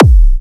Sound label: Trance Bassdrum